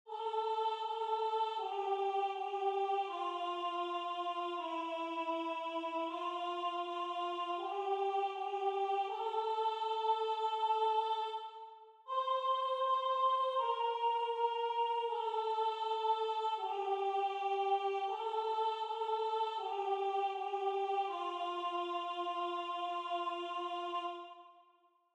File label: Soprano